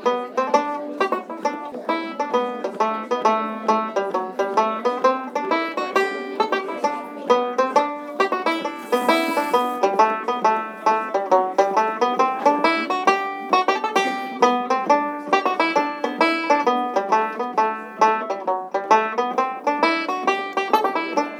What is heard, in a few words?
Hornpipe